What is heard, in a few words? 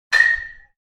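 baseball sounds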